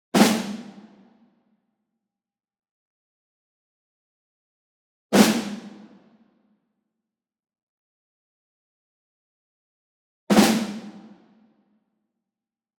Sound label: ENSEMBLE SNARE Group Flam Medium; boom library; Cinematic Strikes Construction Kit